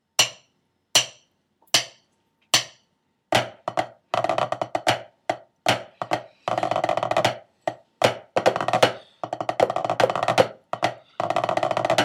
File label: snare tenor; the black isles